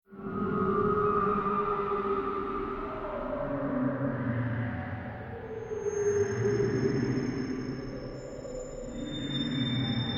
Drone Scifi Horror Alien Ambient Voices Builds To Impact; Scary Soundscape